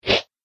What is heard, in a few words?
Whoosh; Film Fx